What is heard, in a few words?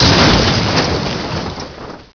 CRASH